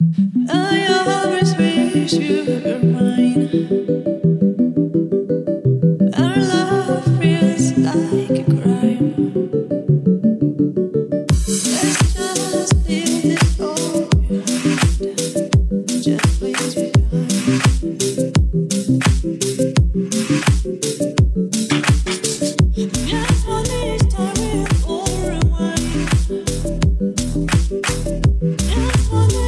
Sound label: Disco Chill Vibes, Lazy Disco